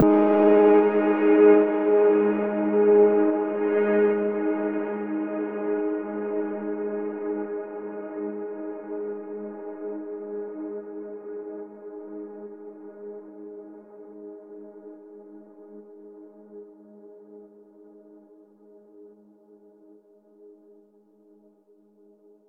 Stinger Exploration Chord Octave

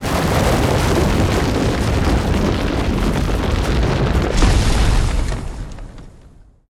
door; Shin Megami Tensei IV Apocalypse; 3DS

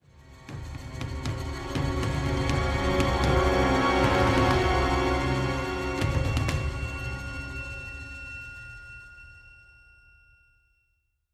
Spooky Ship

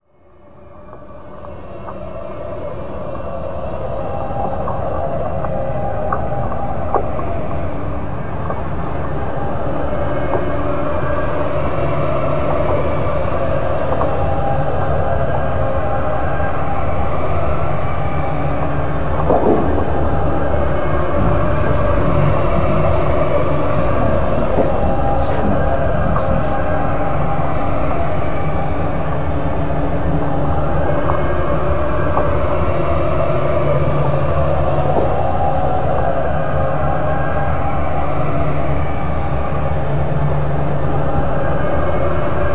Choir Noir; Swamps Up Nostrils

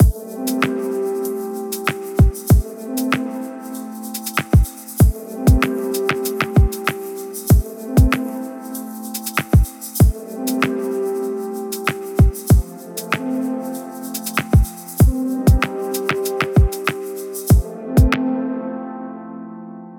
Hotel theme loop; WrestleQuest

